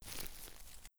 Footstep Grass; Feel; NiceVibrations; Haptic Samples; Footsteps

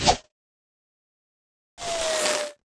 imp tossed attack throw; HighGroundRoyaleNetcode

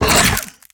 Small Creature Death; Monster Within

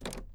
Door Knob; HapticSamples; Objects; Feel; NiceVibrations

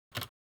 Keyboard; Feel; NiceVibrations

